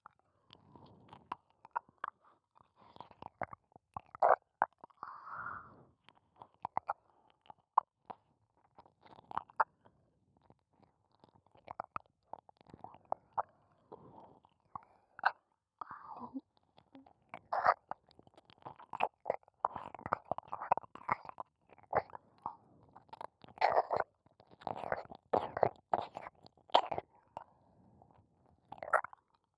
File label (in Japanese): 耳舐め・弱い; 淫乱メイド